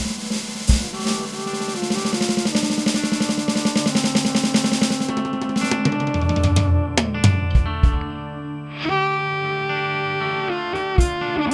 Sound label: peace drum